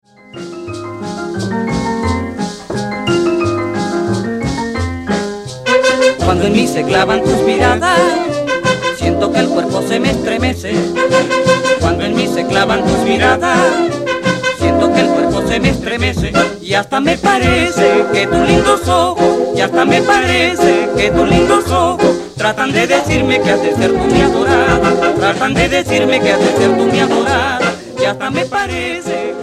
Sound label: Cumbia